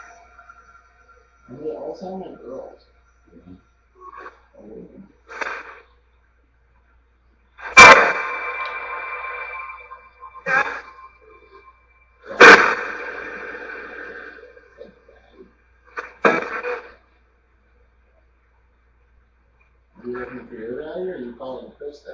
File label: Living Room Portal; historic rainworth lodge investigation